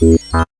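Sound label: SYN BAS, synth bass; X-Static Goldmine 1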